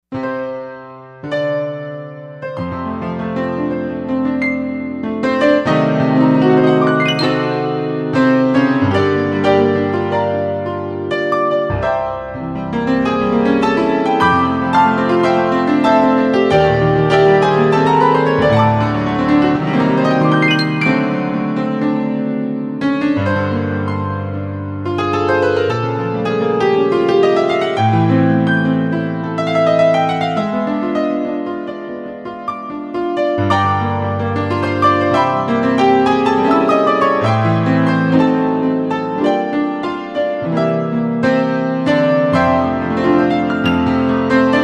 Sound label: Piano